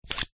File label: household knife remove from plastic protective cover sheath